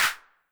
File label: HAND CLAP, 909 Reverb Pitch